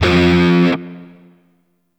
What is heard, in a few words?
Shawzin Oct One F; Warframe; Grineer Shawzin